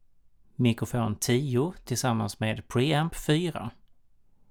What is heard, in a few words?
mic pre